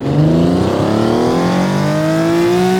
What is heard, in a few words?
first, vehicles; ferrari 365 gts